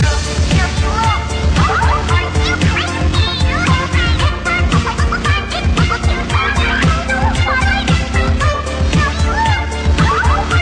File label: Shatter